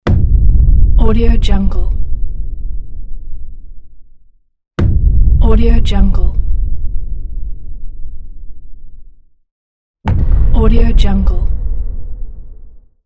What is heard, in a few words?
epic hammer strike